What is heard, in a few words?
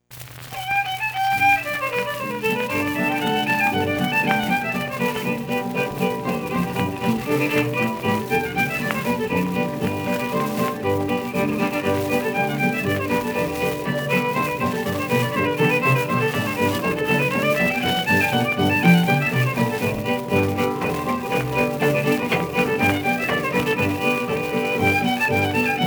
Instrumental